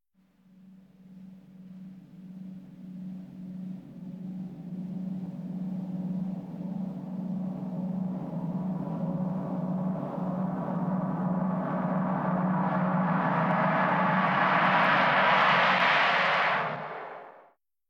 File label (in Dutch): Meteoor, science fiction effects; KMP Film Video Sound Effects